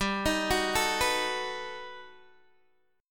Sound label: G Augmented Major 9th G down Guitar DADGAD